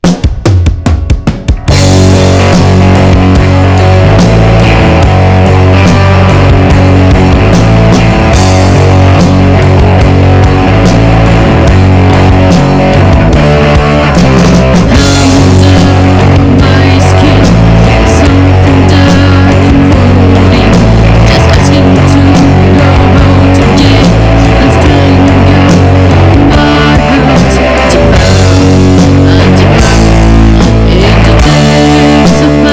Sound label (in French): sonnerie intro